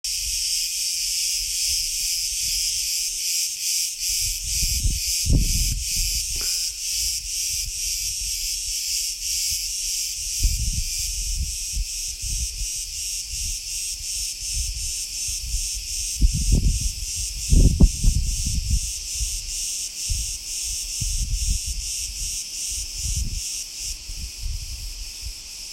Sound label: Cicadas